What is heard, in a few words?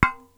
Metal hit